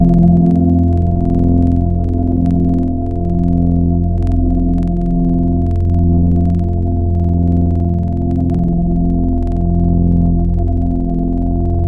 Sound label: Organ D; Synthetic's Serum Collection; Surge Sounds - The Sky - Serum Wavetables